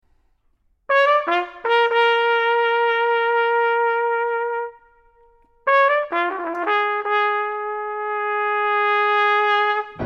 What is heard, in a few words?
Preludes for Trumpet and Piano, Allegro ben ritmato; 3 Preludes; Arr. for Trumpet and Piano by B. & R. Ridenour